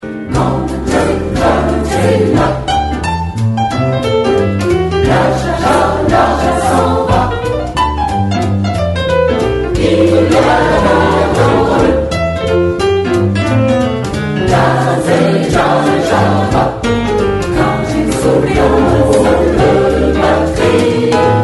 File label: jazz